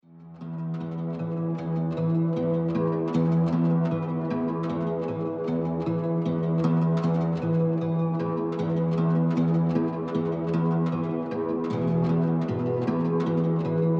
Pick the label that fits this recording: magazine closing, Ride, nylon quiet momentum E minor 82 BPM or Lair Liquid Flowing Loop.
nylon quiet momentum E minor 82 BPM